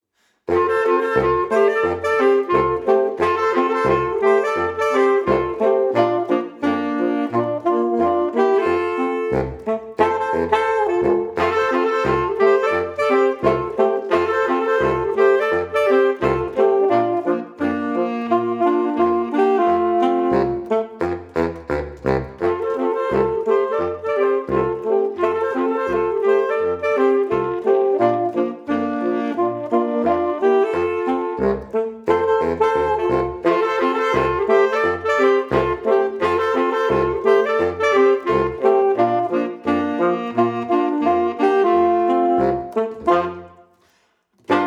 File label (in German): Saxophonensemble